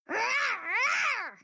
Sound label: rawr; Fae